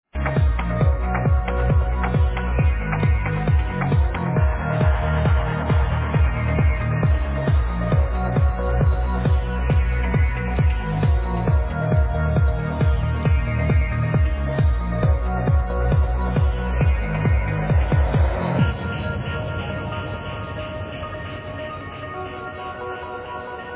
housey trance track sample

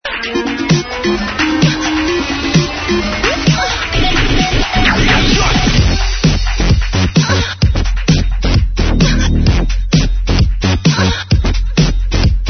electro

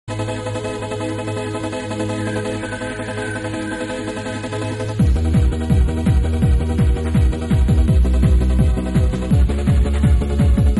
trance; unbekannter klassiker; trance 95

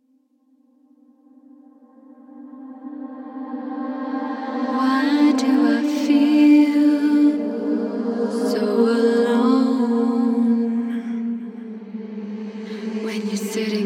ghost vocals test

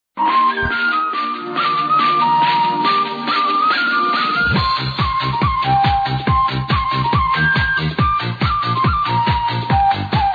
trance

